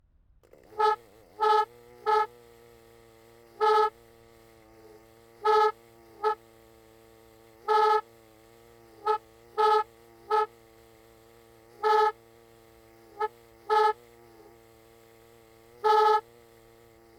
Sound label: martin horn broken close; aXLsound